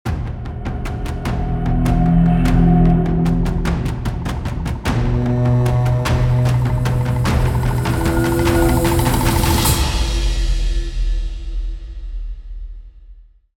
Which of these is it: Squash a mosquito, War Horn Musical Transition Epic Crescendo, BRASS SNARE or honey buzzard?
War Horn Musical Transition Epic Crescendo